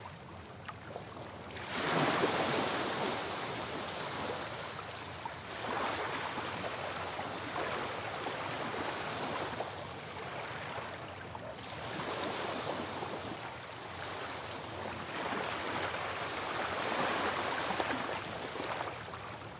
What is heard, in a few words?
OCEAN